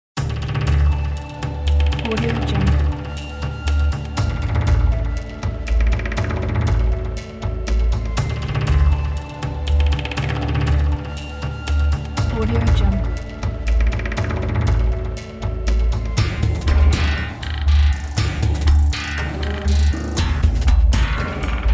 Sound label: industrial hybrid scifi trailer